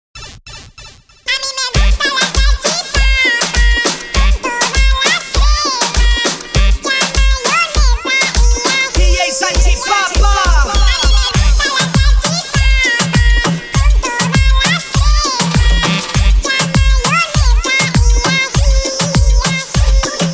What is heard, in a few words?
Bachata Remix